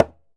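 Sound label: conga pop; E-MU Producer Series Vol. 7 – Old World Instruments; Drums&Percussion; Salsa Kit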